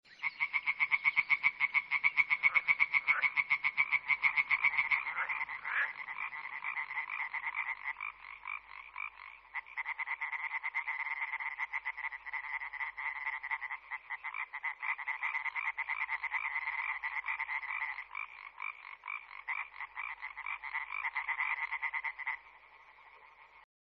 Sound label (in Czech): rosnička zelená hyla arborea